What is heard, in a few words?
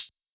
Hi hat, Closed hi hats